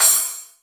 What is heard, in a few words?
small, cymbals